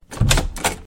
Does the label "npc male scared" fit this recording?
No